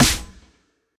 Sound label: Snare Soft